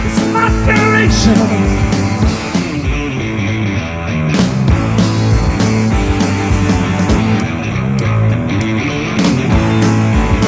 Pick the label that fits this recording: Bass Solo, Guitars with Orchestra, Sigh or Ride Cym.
Bass Solo